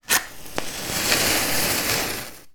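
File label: household matches many light simultaneously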